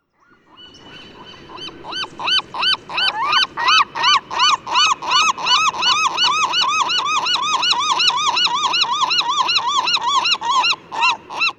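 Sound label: Western Gull long call duet, Stinson Beach CA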